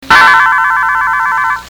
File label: turn page